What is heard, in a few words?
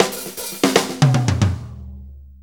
6-8 Fill, Drum Tools